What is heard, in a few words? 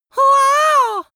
amazed boy